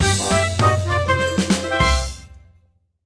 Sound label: outro free spin; jingle spin